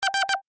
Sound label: UI Deny, User interface feedback sounds; Ui Set H; Computers; Electronics